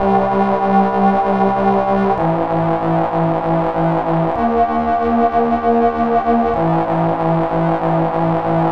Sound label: synth, 110 BPM